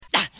noise

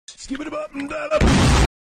exploding toilet

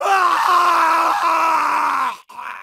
scream; mkx; johnny cage

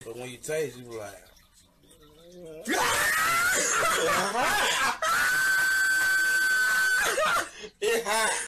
sub alert

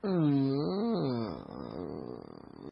hmmmm

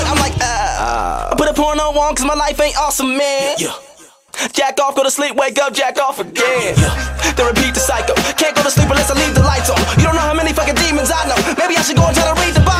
Uhhh

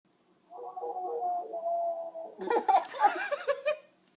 scream; Pillow Screams

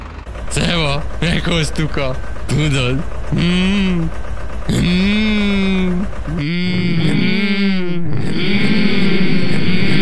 hmm long

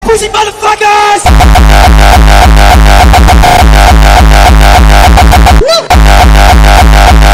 tunnelrave bass boosted

